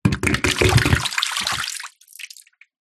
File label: food drink water pour from bottle quick short; zapsplat